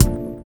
VIBRA; Spectrasonics; Metamorphosis

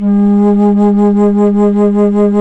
FLT, Bass Flute, BsFlt vib

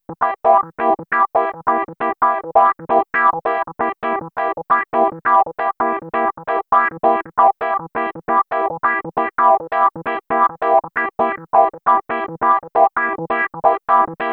Funky Pianos, Electro Lounge; Sonic Foundry (Sony Creative Software); 134 Six On Nine

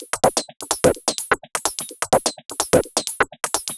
Hardgroove Top 127bpm, House Drumloops; 90 s Sample CDs; Zero-G House Fabrik Drumloops; ZG Drumloops

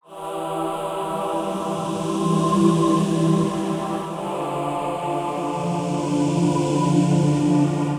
Vox loop (120BPM); musicradar

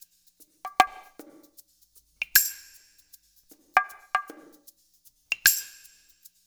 percussion loop; InVision Interactive; Percussion Slam; MACHINE

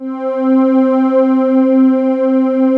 pulse pad C4; INPULSE

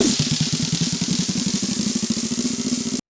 Korg 01W MegaDrums; 300 Drum Machines

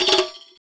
Rek Trill; Roland BK-5; Percussion

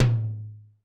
Percussion, Korg 01W; 300 Drum Machines

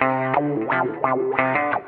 GUITAR

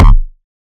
CHOMP KICK

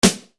ZAP SD, Yamaha RY-30

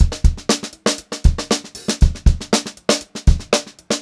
GROOVY BEAT, Funk Groove Drumloops; USB Soundscan vol.38; AKAI

